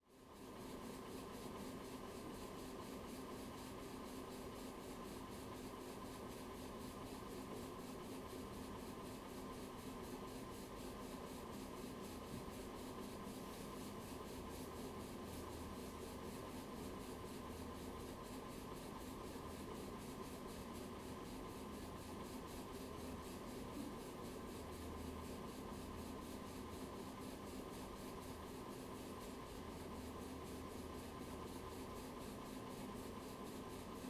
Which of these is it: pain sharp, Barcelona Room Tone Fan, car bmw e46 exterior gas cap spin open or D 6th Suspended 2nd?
Barcelona Room Tone Fan